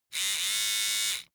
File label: servo steady